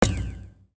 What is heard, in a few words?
Football open; Nokia; audio themes